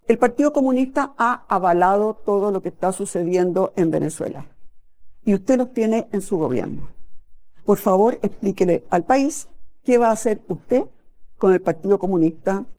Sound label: vocals